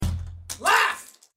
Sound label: laugh